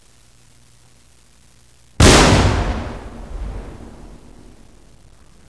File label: Cannon